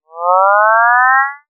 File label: basic Ramping